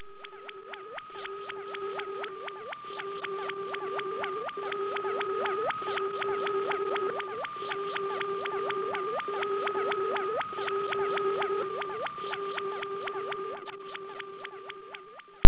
bridge scanner; startrek

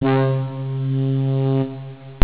synth horn c3

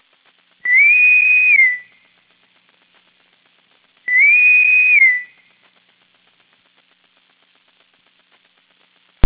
boatswain whistle; startrek

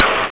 tech; battalion

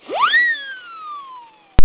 wheee

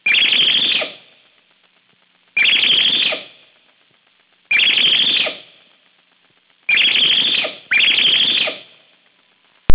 tribble scream; startrek

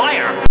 fire; startrek

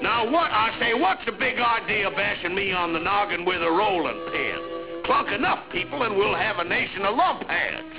clunk; cartoons

